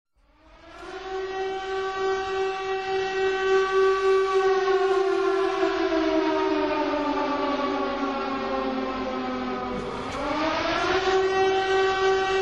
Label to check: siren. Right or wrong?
Right